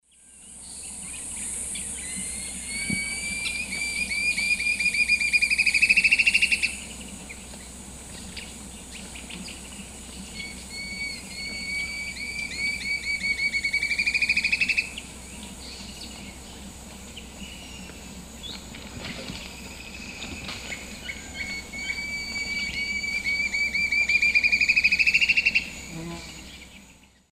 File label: Campylorhamphus procurvoides, song; S. America; Eastern Lowlands